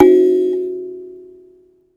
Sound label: Finger, Prepared Kit; Battery 3 Sample Kits